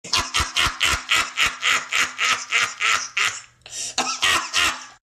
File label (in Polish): smiech